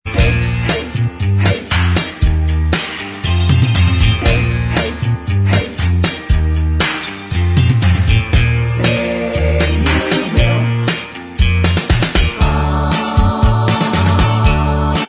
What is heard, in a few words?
Bvox